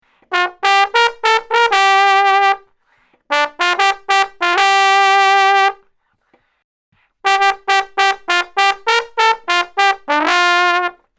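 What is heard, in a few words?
Trombone Solo; Trmbne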